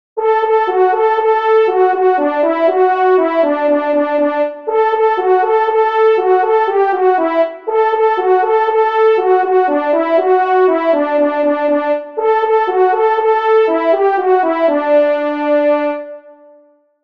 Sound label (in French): Appel fanfare des maitres Solo; Fanfares imposables en Concours